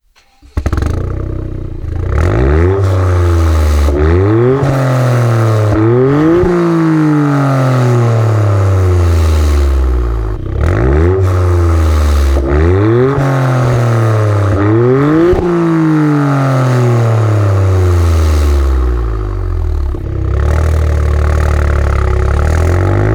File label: Toyota Yaris Racing; remus